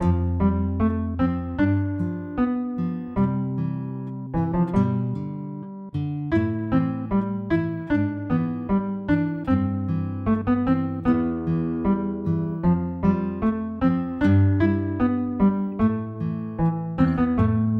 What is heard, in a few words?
artacademy bgm loop 76bpm CMajEMajMin